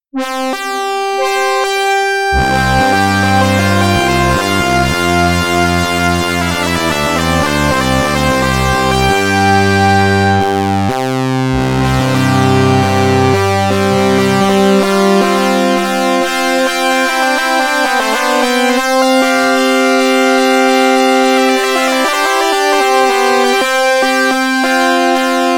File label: Rainy Day Fanfare